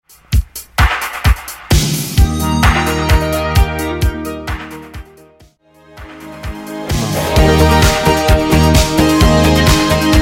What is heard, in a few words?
80s Dance Redrum